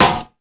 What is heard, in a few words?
Snare Crack It